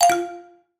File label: lobby waiting